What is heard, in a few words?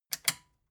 metal button click power off fast